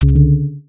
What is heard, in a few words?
sfx warning beep